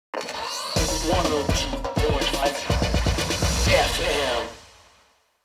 Radio Car Hiphop Jingle; gangstar rio city of saints

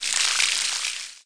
Flower Water; New The Denpa Men; android

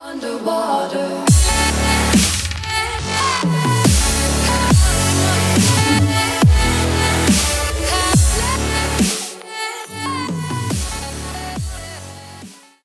Stinger; Champions Field

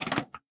motherboard Slot

